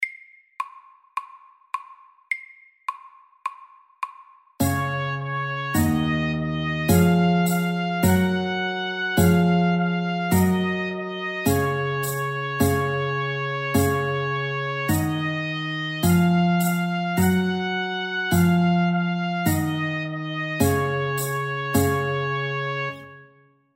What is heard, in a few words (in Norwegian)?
Folksong SAKTE